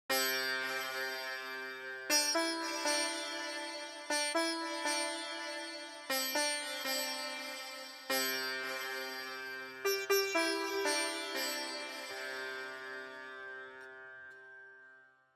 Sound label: sitar